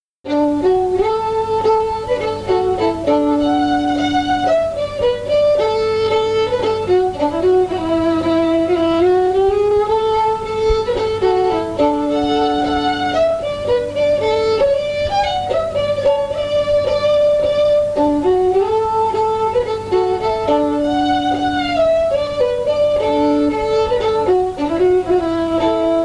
march fiddle; centenary march; tune tutor; SeamusGrantFestival

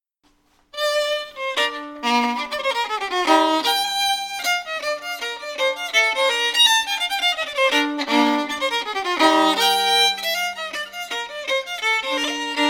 hornpipe fiddle; newcastle hornpipe; tune tutor; SeamusGrantFestival

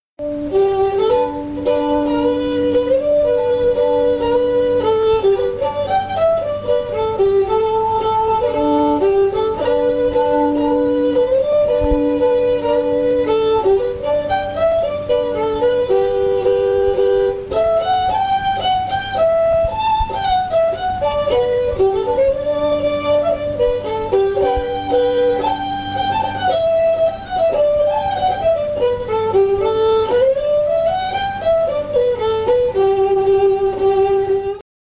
shane o neills march fiddle; tune tutor; SeamusGrantFestival

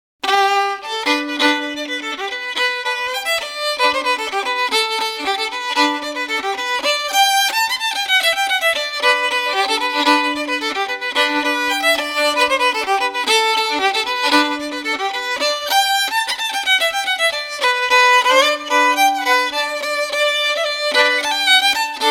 white cockade fiddle; tune tutor

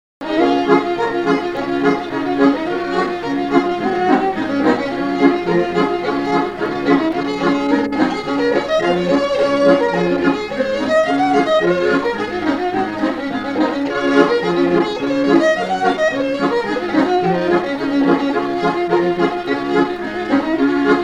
mountain road fiddle; tune tutor; SeamusGrantFestival

